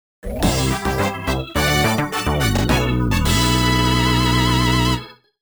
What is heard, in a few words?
Boss Mission Success; Mario Kart DS